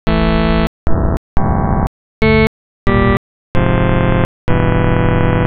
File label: first chaos synth